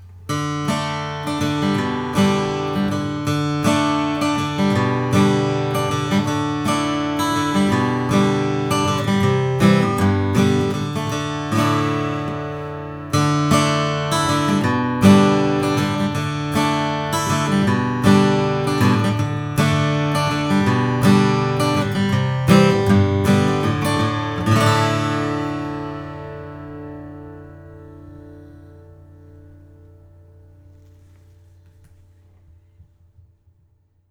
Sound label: taylor style 2 cutaway koa